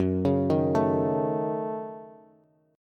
Gb-Minor 6th, Bass; arpeggiated; JGuitar

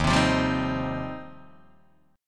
Eb 6th Add 9th, Guitar, Open D

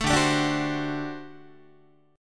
D 11th D, down, Guitar; D11 strummed; tuning 4A,4E,5A,5D,5G#,6C#

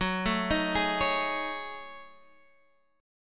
F sharp Minor 6th Add 9th, Guitar, Half Step Down

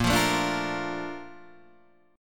A sharp Major 7th, Guitar Half Step Down

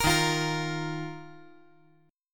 Eb Suspended 2nd; Ebsus2 strummed; JGuitar

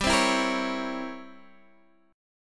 C 7th Flat 9th G

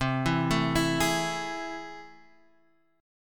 A Minor Major 7th C, chord

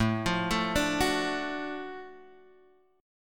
F sharp Minor Major 7th Sharp 5th A

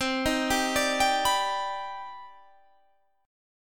C Minor Major 9th C

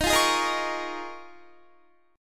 Eb 7th Suspended 2nd Suspended 4th Eb, Guitar; Eb7sus2sus4; strummed

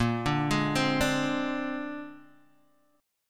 Bb 9th Bb down Guitar Open G

